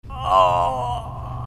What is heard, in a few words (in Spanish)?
grito